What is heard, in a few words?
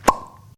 Flopp